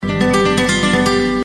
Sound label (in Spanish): guitar